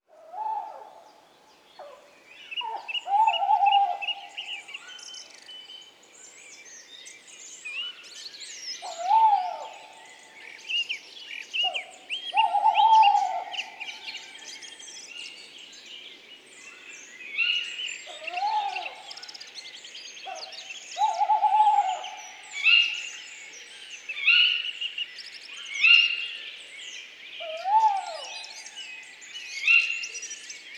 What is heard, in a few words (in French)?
Chouette hulotte; abc de la nature